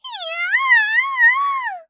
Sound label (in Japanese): "いやあああん！", 元気; ハイグレボイス集